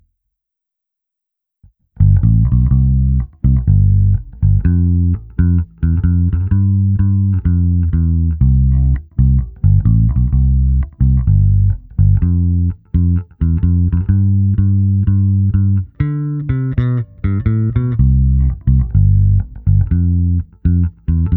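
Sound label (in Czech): Černá se simulací aparátu, zkreslení, s trsátkem; Xotic XJ-1T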